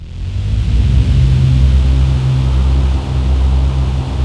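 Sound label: THX surround; Pro-Rec - Synthsonic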